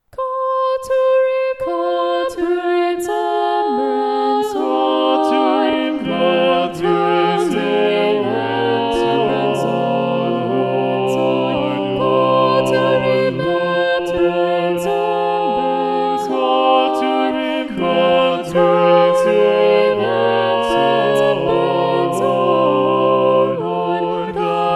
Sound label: SATB Balanced Voices; Call to remembrance; Richard Farrant